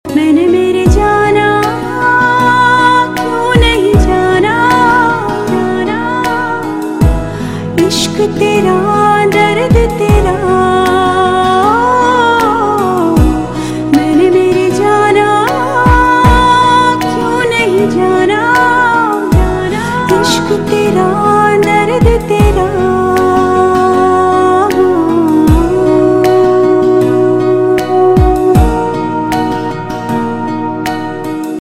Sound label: Female Ringtone; Indian Pop